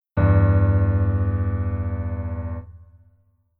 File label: Keyboard Voicing